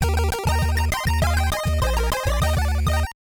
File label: Level Complete